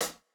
Jrock hihat

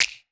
clap

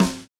snare, snare house

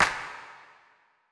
clap; Electro; chillhouse

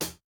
hihat, house

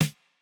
snare; Reference Beat BPM120; j city pop 1980

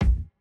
Jrock kick

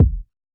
90s hiphop kick; Reference beat bpm90

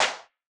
Modern snare, Electro

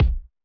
Hiphop kick; Reverb+EQ+Comp+Limit

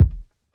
kick, lofi hiphop; Legendary lofi hiphop

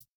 hihat; Reference Beat BPM120; j city pop 1980

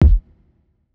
Jazz hop kick; Hiphop

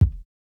kick, house

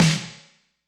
snare; pasific; Pop

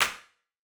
Modern clap, Electro

